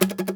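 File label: 83 Chuck Guitar Up; Mo' Phatt; Digital Sound Factory Mo' Phatt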